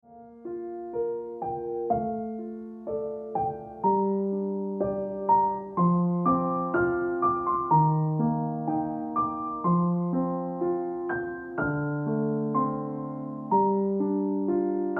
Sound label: Ringtone Piano Music